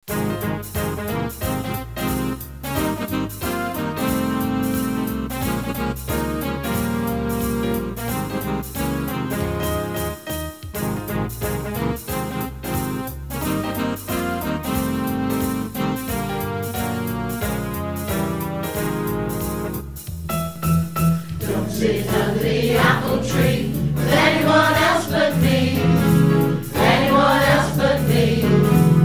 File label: Dementia Choir; Cleethorpes; north east lincolnshire